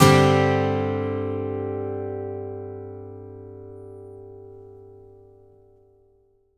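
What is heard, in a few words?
acoustic guitar G sus up, Whole Lotta Country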